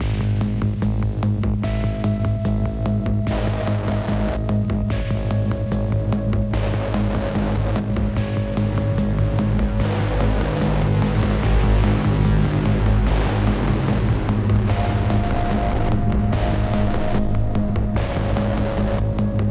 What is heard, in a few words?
Music Tension Pulse Med Tempo; Battlefield 3